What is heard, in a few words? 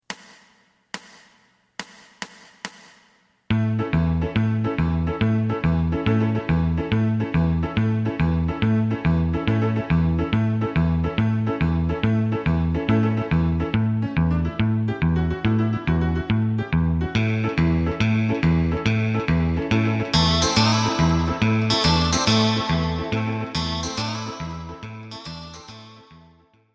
drum; エレキバンド用カラオケ